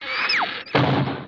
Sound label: clam; The Interactive Alphabet